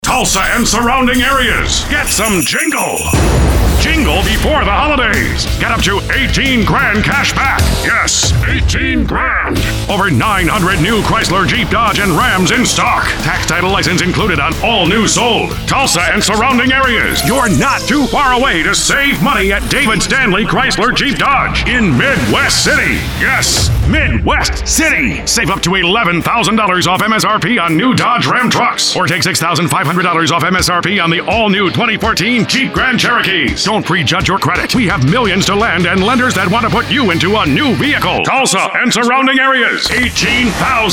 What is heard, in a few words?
Jingle; David Stanley; Tulsa